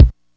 DPM48 BD, Sakata DPM 48

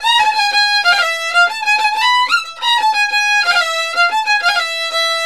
VIOL L, Balkanish Traditions; USB Soundscan vol.63; AKAI

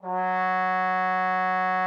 trombone F#3; Xsample VOL-3

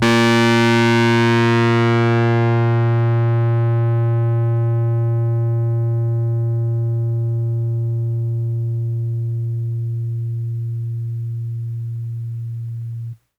RHODES FUZZ

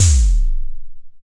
XTRA PERC

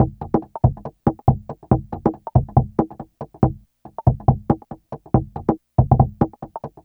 Accent Groove, Drums & Percussion, Electronica; Sonic Foundry (Sony Creative Software) - Electronic Point Blank

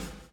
BLOW; Spectrasonics - Backbeat

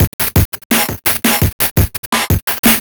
Classic Beat, Drum N Bass

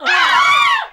HAL CL. SIN, CL. SINGIN`; Sound & Vision - Gigapack II CD 1

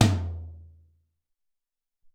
Deep 14 Tom, Alesis SR-18

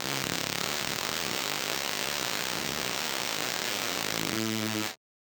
Casio, Glitched, malfunctioning synth

